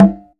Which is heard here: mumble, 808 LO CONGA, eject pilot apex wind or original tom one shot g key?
original tom one shot g key